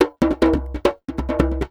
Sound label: Djembe loop 140 BPM; musicradar